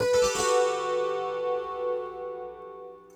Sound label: Kantele Down; Sonic Foundry (Sony Creative Software); Friendly Fire